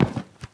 concrete, footsteps; Sourceforts2.0

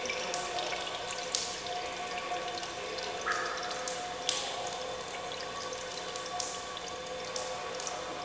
waterleak loop, canals; hl2

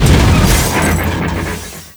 car trap explode impact

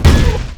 dog footstep run; hl2

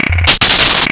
mine shock vehicle, roller; hl2

